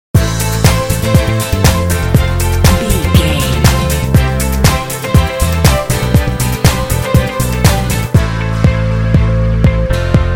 inspirational corporate pop sting

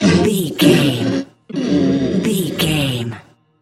troll monster death slow

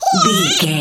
goblin fairy attack fast

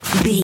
footstep snow slide

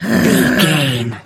voice female growl groan

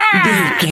voice male hurt pain

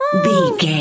voice fun small character emote scared

